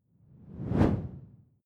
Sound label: whoosh simple low; swooshes-swishes